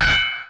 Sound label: cymbal, Roland MC-909